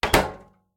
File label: machine close; factorio; Factorio 0.14.21